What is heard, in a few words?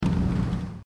end moving; TankiOnline2.0DemoClient